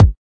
kick

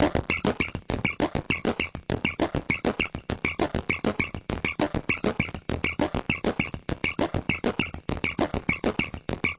100 bpm fm plurper, drum loops and breaks

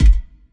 ceramic kick; Kicks; single hits

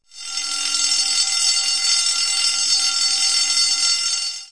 building fire alarm; Sound FX Real Life

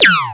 laser powered sword

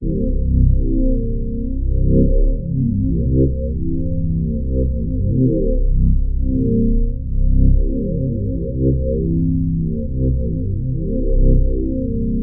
harmonic bass flange; Electro and Synthetic

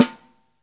real snare; REAL LIVE KITS; A Real Freakin Drum Kit

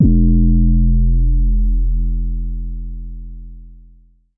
kick, drums single hits; iElectribe Kicks

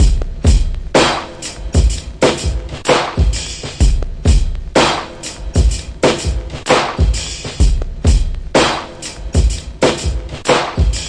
funky, drum loops and breaks, 063 bpm

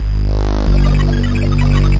Electro FX; Ueb HE Electro FX

